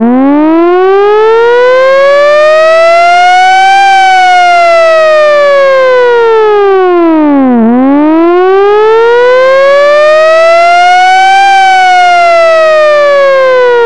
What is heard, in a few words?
vintage hi lo siren